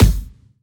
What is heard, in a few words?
kick oneshot thunder, Pop; Drums; SD PASADENA Pop Sample Pack; Slate